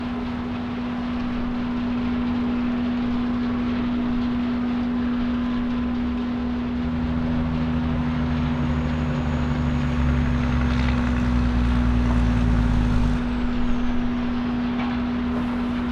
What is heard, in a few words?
outside sawmill